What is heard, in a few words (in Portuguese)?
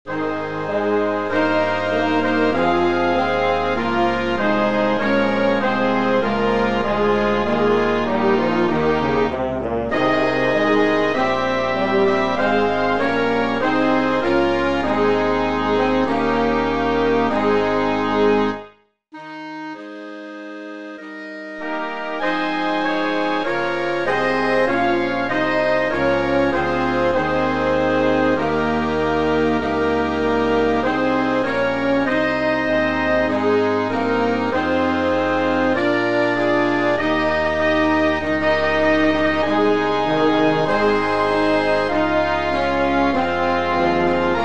Hino Filarmonica; hinos; Fonte do Bastardo